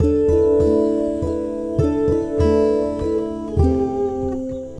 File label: Guitar And Synth